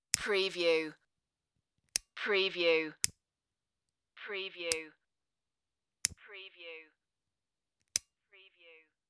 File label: cigarette lighter